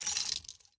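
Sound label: Reload Belt Movement; Valorant; odin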